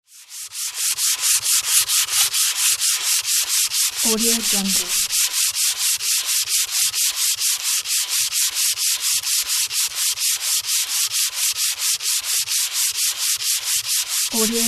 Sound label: sandpaper fine; palmirasoundstudio